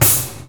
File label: Drop, INDUSTRIAL